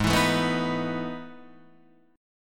E Major 11th chord

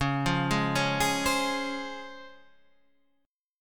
C sharp Major 7th C sharp, Guitar Standard; C#M7 arpeggiated; 9,8,6,6,9,8; JGuitar

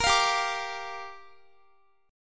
G Minor 7th, Guitar; strummed; 4F,4A,5C,5G,5C,6E; Gm7; x,x,22,22,19,22

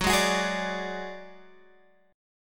Gb Suspended 2nd Sharp 5th, Guitar, Open G

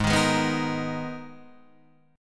G sharp Minor Add 9th G sharp; madd9:1,b3,(5),9

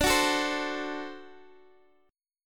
D 7th, D, down, Guitar Open D

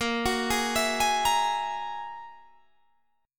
G sharp 7th Suspended 2nd Sharp 5th

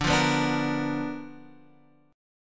B Minor Major 7th Flat 5th D, chord

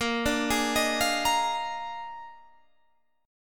Bb 11th, Guitar, Drop D

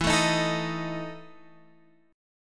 E Major 7th Suspended 4th, Guitar, Open F; x,11,9,10,12,10; strummed

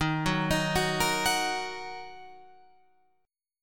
D sharp Minor, Guitar, Open F; arpeggiated; JGuitar

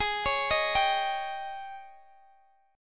Ab Major 7th Suspended 4th, Guitar, Open G; down

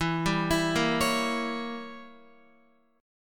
E Diminished 7th E, Guitar; Edim7 arpeggiated; JGuitar

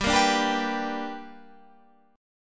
B Minor Major 7th Sharp 5th

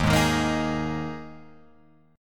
Eb Suspended 4th Eb down Guitar Open F; Ebsus4 strummed; JGuitar

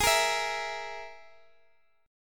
Ab Suspended 2nd Sharp 5th Ab down Guitar Open G

